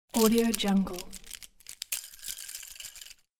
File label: plastic sticks rustling; domestic sounds